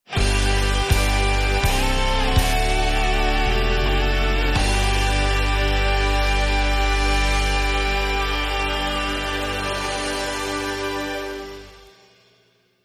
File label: God Save The Queen Rock Guitar, Sting